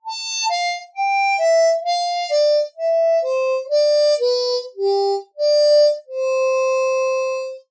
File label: Slide Note Exercise